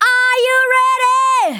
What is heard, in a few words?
VOCALS SUNG; Techno Trance Essentials